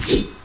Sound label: slash; svencoop